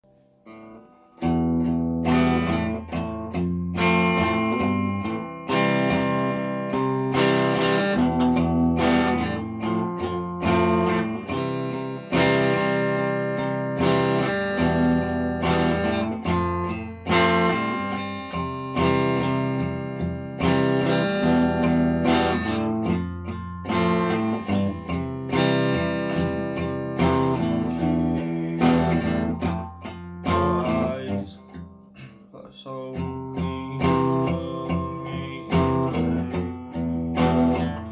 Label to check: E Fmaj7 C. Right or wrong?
Right